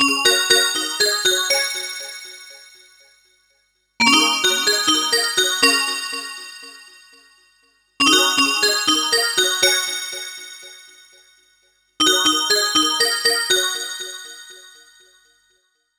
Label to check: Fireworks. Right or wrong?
Wrong